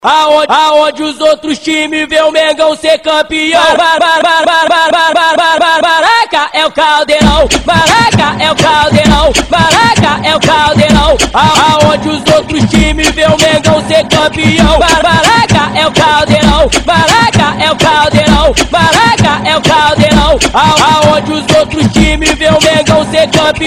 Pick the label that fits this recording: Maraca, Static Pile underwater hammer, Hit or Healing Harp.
Maraca